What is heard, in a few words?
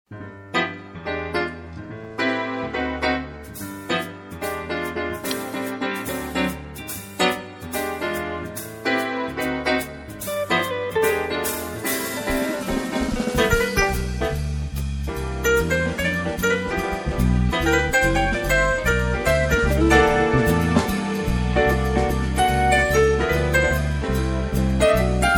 Jazz Medley